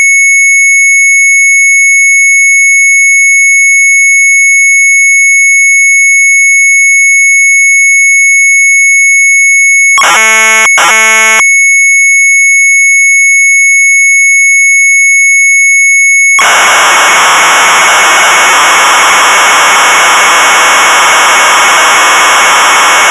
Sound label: MZ800 Tape, sharp; NOTEN LEZEN; retromelsarchive